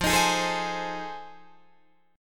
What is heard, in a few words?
F 7th Sharp 9th, Guitar, Open D